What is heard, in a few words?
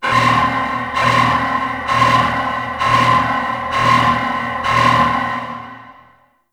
Alarm; Warframe; Tau; Cinematics; BlueDawn